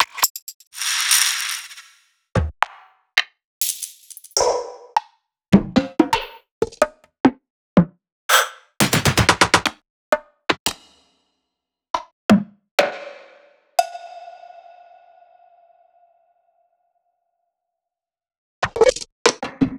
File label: Two Shots Perc; Sliced